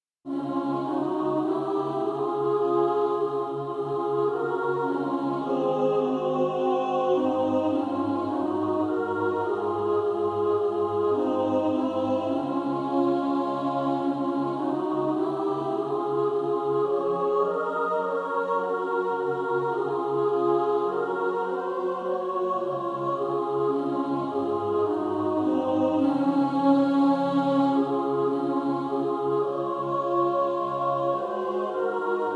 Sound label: Under His Wings mixed